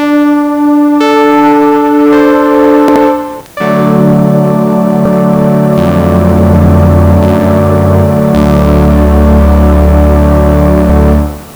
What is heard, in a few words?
jingle; Ospiro